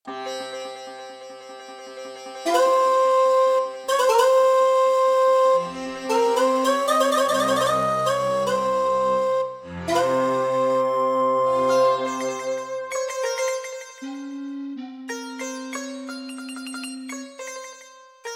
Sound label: Intro, Roland Edirol SD-20 GM Contemporary; Prince of Persia 2 - Intro